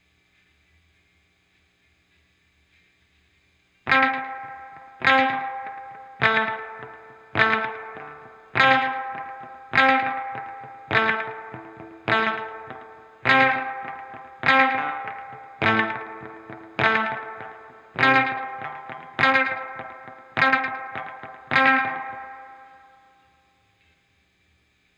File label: guitar volume swell